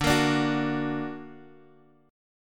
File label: D Augmented Guitar Half Step Down, chord